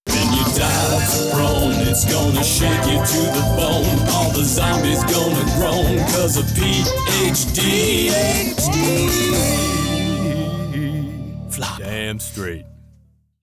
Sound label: Sting; Zombie Perksacola - Phd